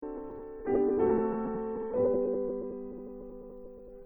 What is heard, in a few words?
piano test